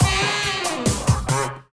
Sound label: Endless Lose; Buster Jam; MSX2